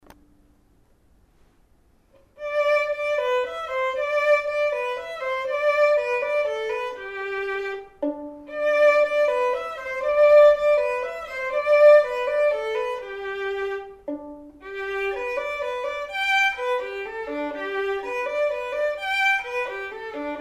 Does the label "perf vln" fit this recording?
Yes